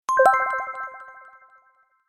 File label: Smartphone notification ping alert tone